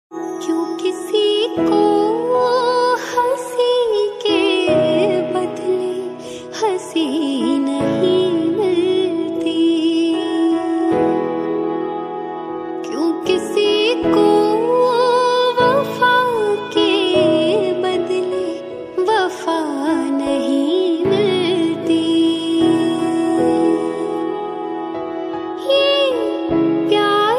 Old Hindi Female Ringtone

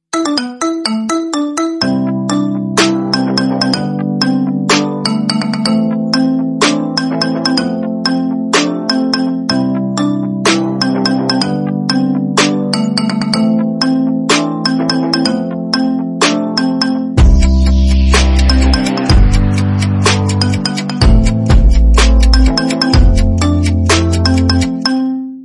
Marimba Remix Ringtone

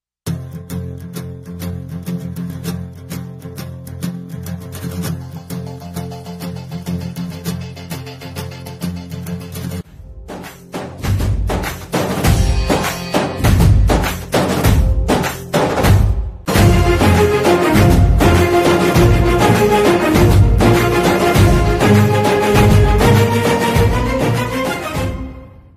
BGM Ringtone

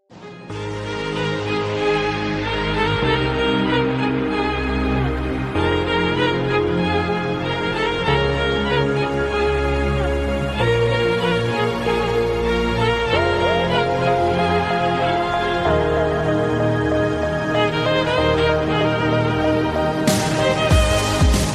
Piano Instrumental Ringtone

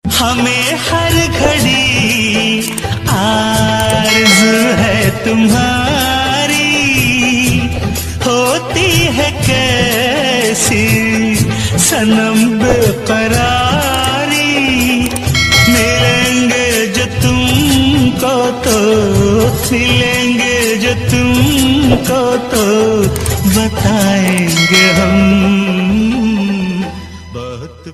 Old Hindi Ringtone

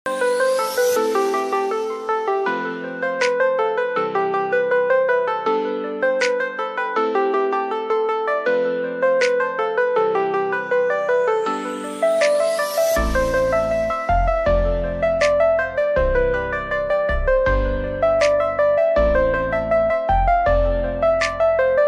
Song Instrumental Ringtone; ringtones

